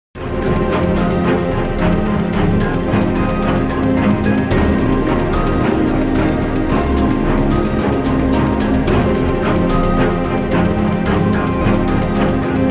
Asian Flower

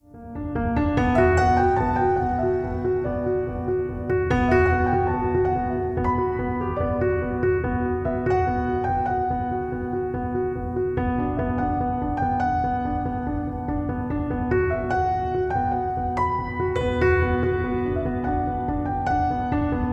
rhythm theme

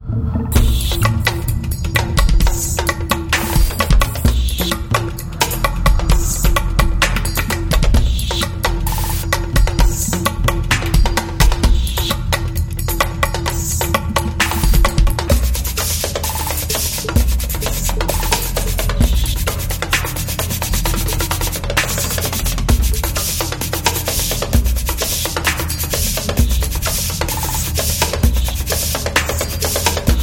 Frenetic Rhythms

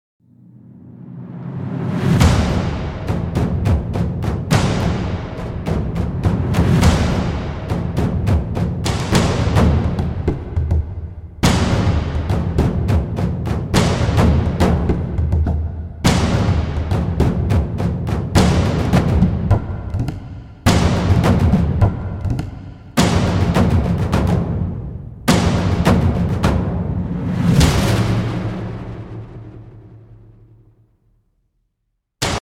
magma drums

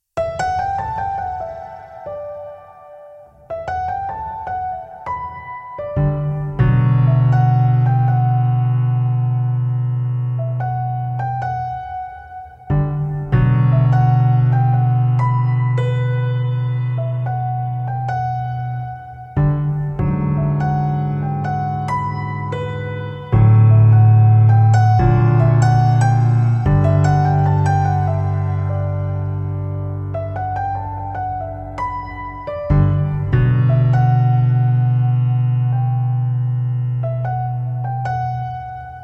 chords theme

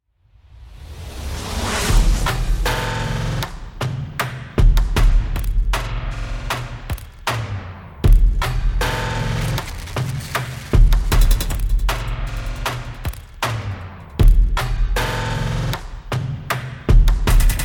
drums

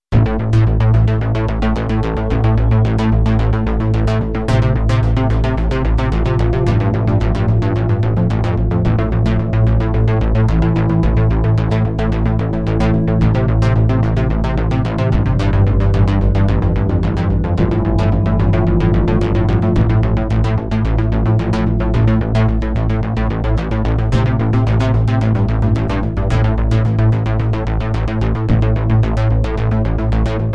humble train synth bass

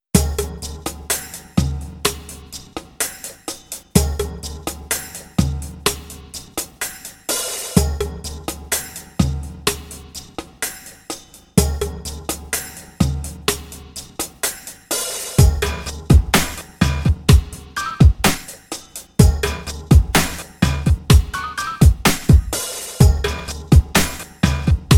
didgeridoo complain drums

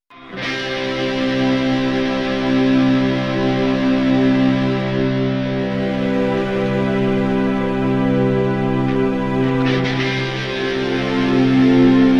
igacu challenge string and guitar

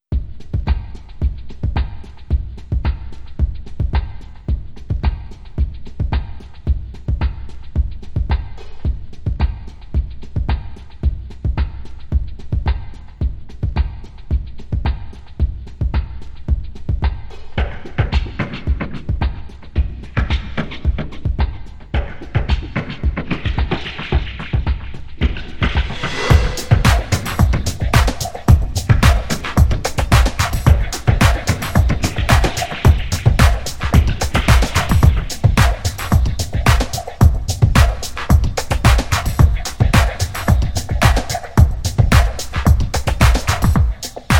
humble train drums

